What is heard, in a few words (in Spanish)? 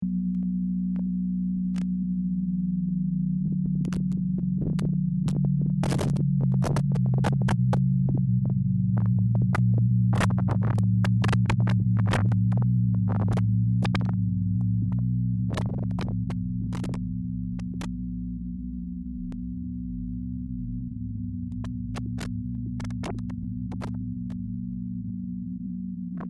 fabricas sound